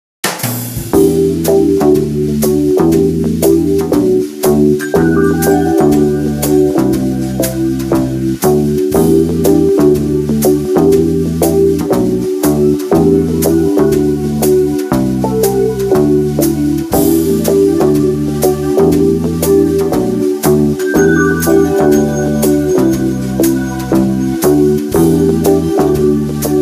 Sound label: swing player